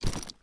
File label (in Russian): bryacanie karabina pristegnutogo k ryukzaku